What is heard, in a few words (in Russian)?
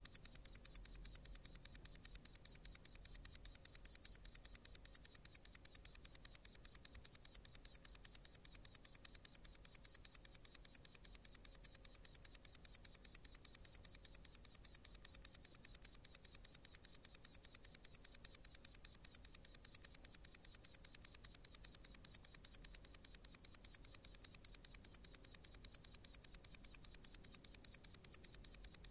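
секундомер тикает очень быстро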